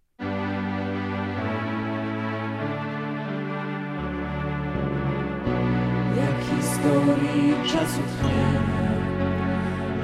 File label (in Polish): hymn muzyka